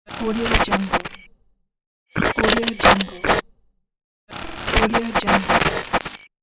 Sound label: glitch